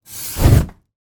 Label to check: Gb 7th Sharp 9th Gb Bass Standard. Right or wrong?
Wrong